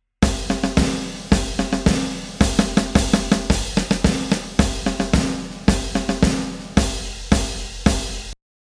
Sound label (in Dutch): Fanfare Trom; Toontje Hoger